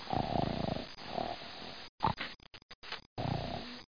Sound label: snore; 10,000 Graphics Pack Volume 1; Media Graphics International